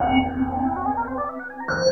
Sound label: Noise Run; Sound FX